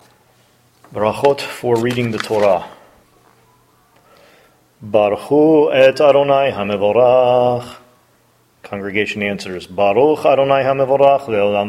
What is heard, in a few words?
Bracha Before Reading Torah